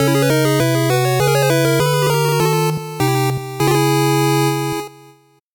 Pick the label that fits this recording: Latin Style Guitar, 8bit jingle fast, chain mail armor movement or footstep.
8bit jingle fast